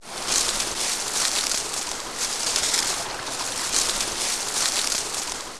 Footfalls along Stream; footsteps

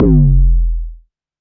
808 BD long, Roland-808 Drum Hits Bass Drum

